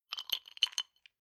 Bar Drink Ice Cubes Movements In Empty Glass Clunking Rattle; Drink Mixing Sound Effects